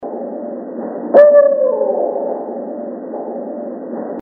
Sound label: BARRED OWL 1 NOTE